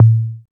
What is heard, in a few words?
Tom One Shot A Key, tom drum tuned to the A note. Loudest frequency: 128Hz